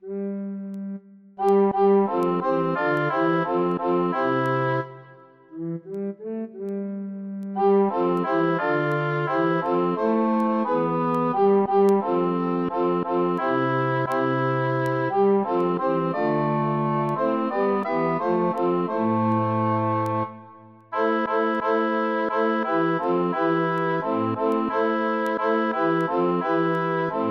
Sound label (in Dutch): PRECES tenoren